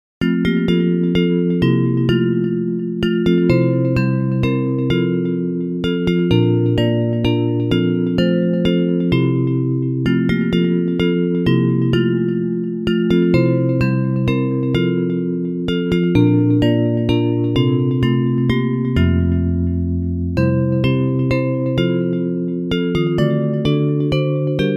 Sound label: Trust and Obey Bells; Hymns; Praise